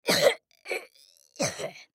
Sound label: Human Cough; Cough Painful Long Male Twelve Yrs Boy; Human Cough Painful Long Male Twelve Year Old Boy; Sick